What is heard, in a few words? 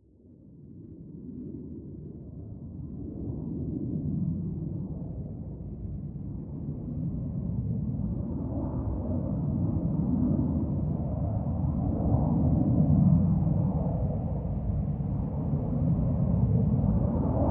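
ambience Golf Crowd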